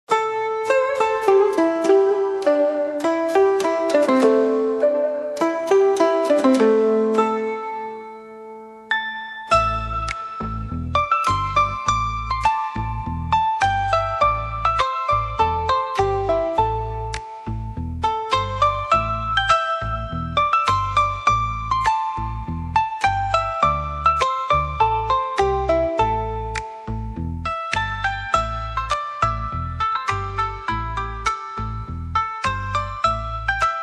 Asian Music

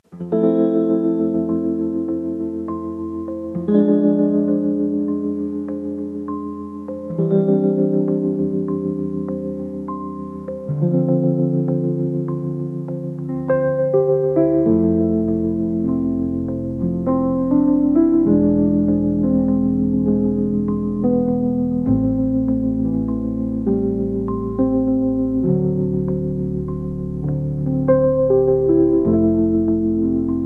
silent piano

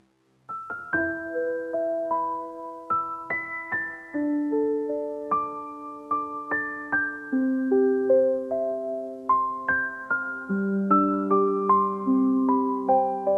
silent piano Gentle Sounds

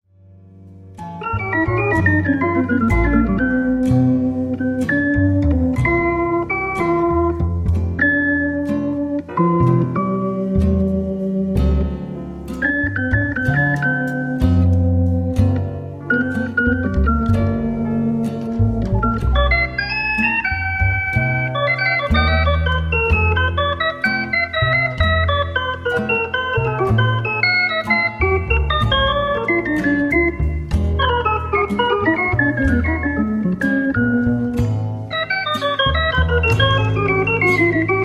jazzy improvisations

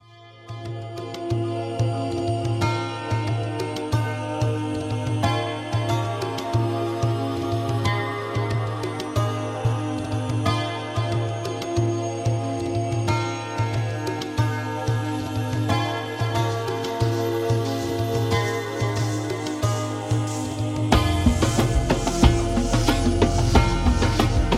oriental music; luminous reflections of oriental music

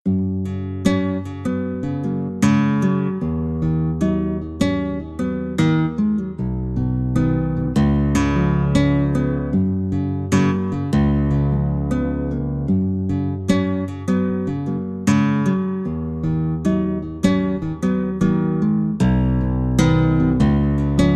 guitar, 432 Hz; magiczna muzyka

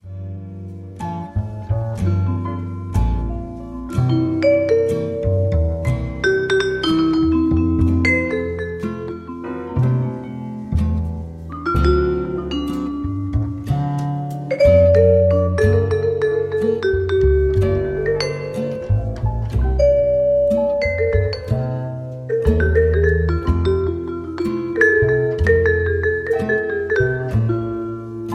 Jazz; jazzy improvisations

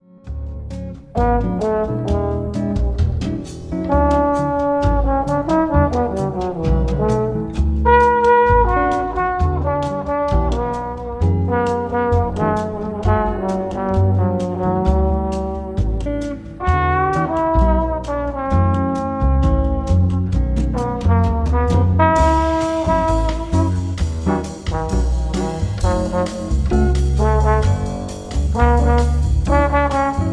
jazzy nostalgia

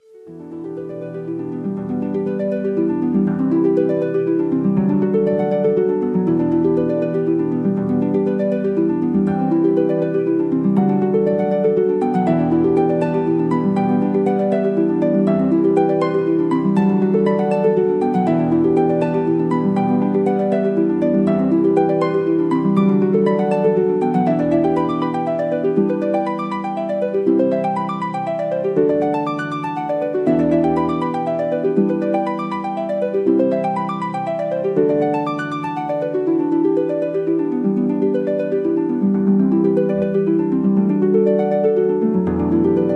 celtic harp

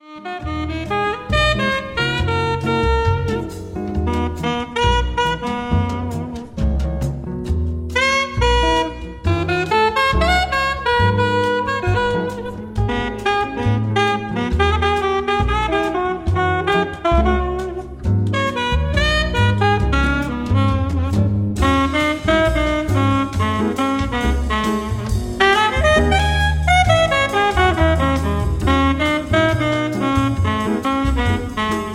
nocturnal jazz melodies

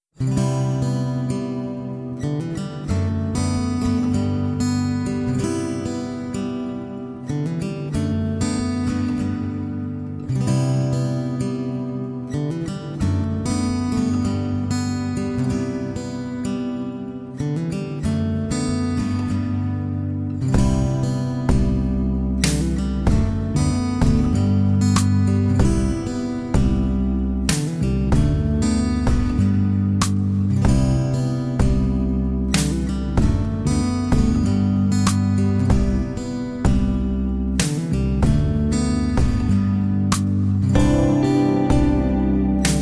Guitar; happy dreams

